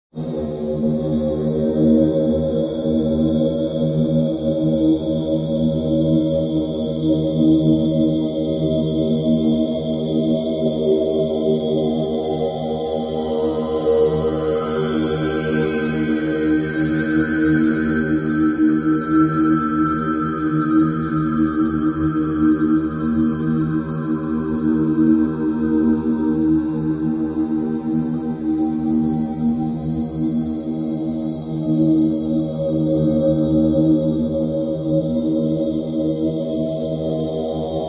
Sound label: church monks